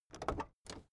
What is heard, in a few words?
car camaro open door